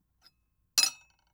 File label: Spoon Saucer; Haunted Bloodlines; SFX Producer; Eating Cinematic